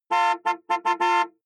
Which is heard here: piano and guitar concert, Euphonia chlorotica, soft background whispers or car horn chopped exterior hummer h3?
car horn chopped exterior hummer h3